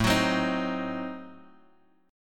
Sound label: A Diminished 7th A down Guitar DADGAD